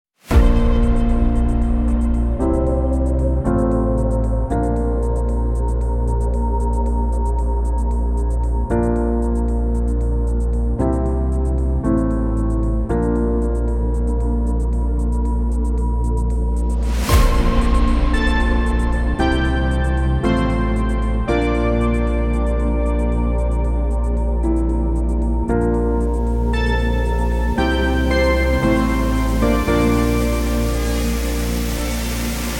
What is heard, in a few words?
Digital Rain